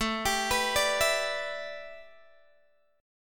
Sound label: A 9th Suspended 4th Guitar Open G, chord; x,14,17,16,15,14